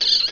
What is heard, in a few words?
rat die; hl1 npc